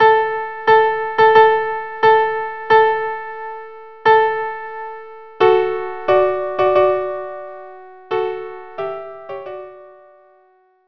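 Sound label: violin unaccompanied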